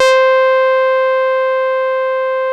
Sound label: RAW SAW, BASSES; Masterbits - Vintage Voltage; VV BASSES